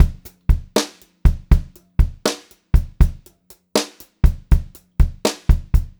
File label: BEAT, Brushed Drum Loops